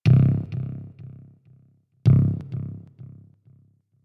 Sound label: Day Amb Stinger, gamerip; dying light